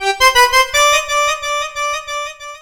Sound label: SYNTH, ARABIC